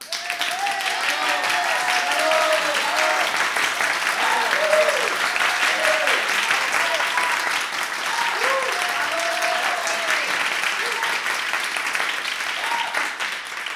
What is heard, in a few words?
enthusiastic applause